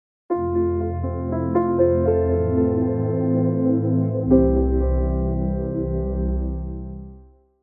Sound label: emotional piano